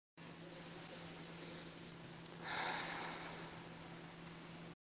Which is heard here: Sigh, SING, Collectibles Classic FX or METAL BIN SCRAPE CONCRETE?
Sigh